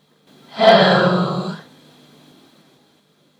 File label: pitch layering